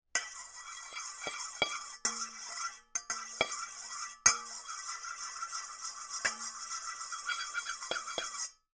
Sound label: foley metal scrape whisk scrape on metal pan circular